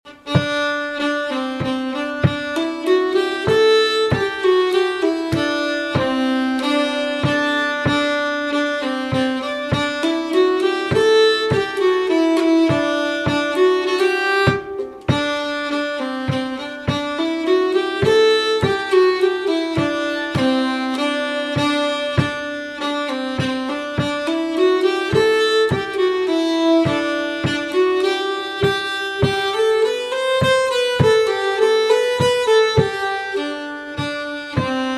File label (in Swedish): Vevlirepolska (harmony); slangpolska southern